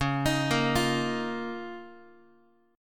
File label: Db 6th Add 9th Db, down, Guitar; arpeggiated; x,9,6,8,9,x; JGuitar